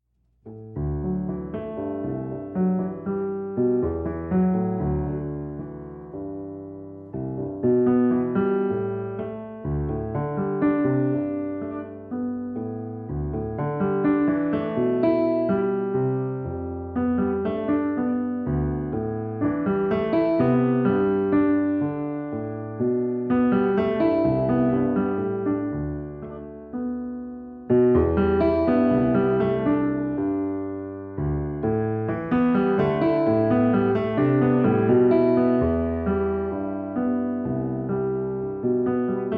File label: Improvisation